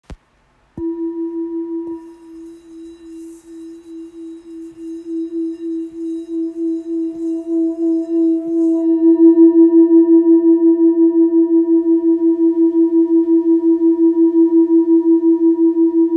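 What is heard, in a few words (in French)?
Full moon mi, bols chantants tibétains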